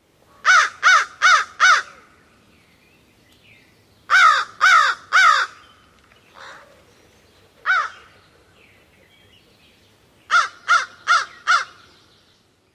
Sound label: caws